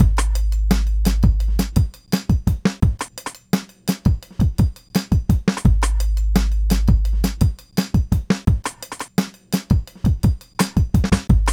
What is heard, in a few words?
Beat, Hip-Hop